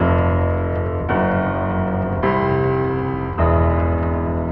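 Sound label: piano impending doom, Sad Loops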